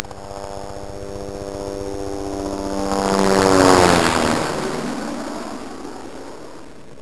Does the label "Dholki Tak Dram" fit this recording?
No